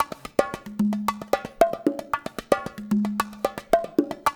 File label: LOOP 6 L, Percussion; InVision Interactive; GRUNT